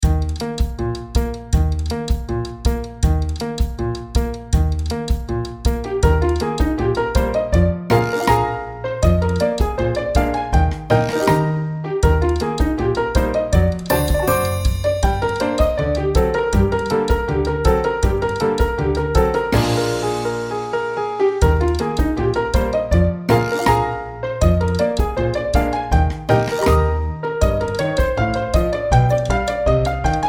Pizzicato D Lite

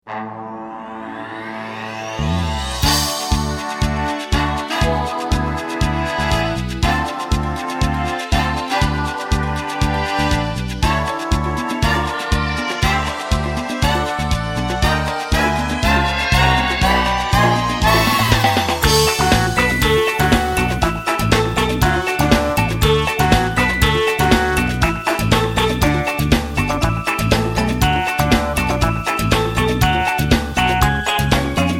Abra Instrumental

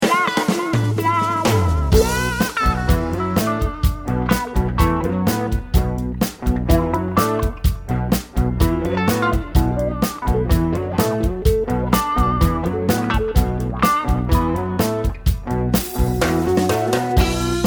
blues

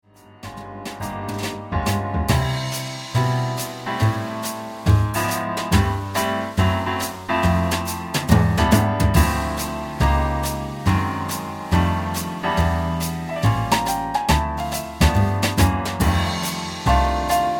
Jingle Bells

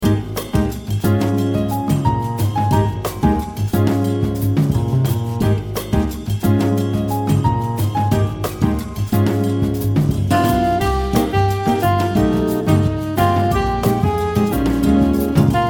Soccer Samba

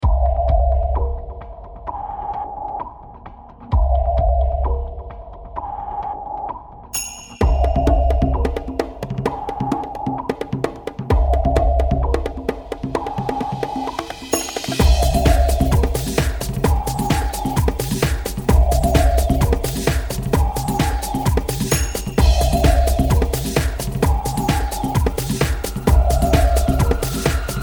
Bee String

